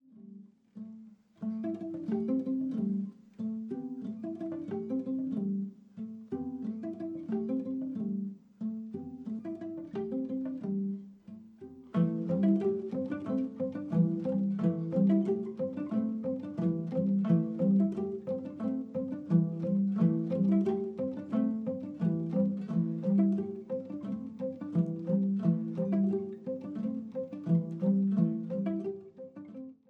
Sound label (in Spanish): Paisaje cubano con rumba; Candombe